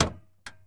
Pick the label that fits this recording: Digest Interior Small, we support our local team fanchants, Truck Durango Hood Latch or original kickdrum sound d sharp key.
Truck Durango Hood Latch